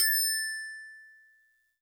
Tubular Bells DX100 A6

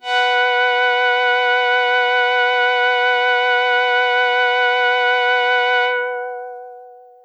PAD Strings high

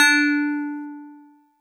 StarPiano DX100 D4

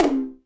Tom Smack Hi

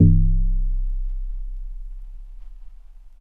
DX200 Pick Vinyl Synths C0, bass

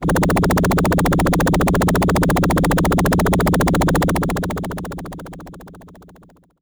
Helicopter FX DX100 A2

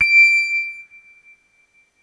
soft tines D6

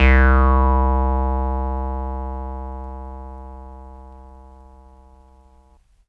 Lead2 Res Classic Vinyl Synths C1, bass

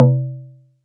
Perc C3

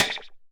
Rim Metal Slide

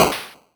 Noise

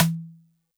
Xylo Snare DX100 E2

